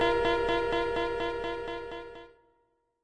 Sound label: sick horn delay; Various Strange Sounds and Effects